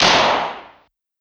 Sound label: dark clapz; Polyend; Legowelt